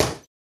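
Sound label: Boxing Bag Hit Short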